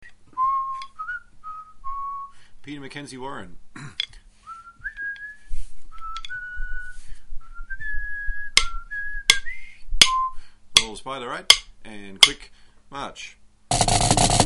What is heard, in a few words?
snare drum